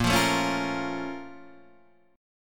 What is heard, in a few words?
A sharp Minor Major 7th; A#mM7 Chord {6 8 7 6 6 6} strummed